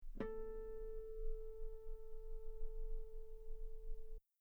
guitar A3 very long piano harmonics; bananenkeyboard

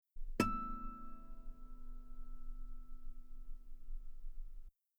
guitar E6 very long forte harmonics; bananenkeyboard

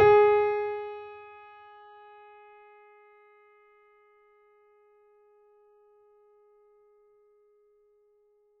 mini grand; bananenkeyboard

